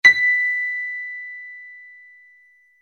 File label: piano B; instrument